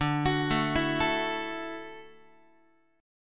D-Major Add 9th, D, Guitar, 4A, 4E, 5A, 5D, 5G sharp, 6C sharp; arpeggiated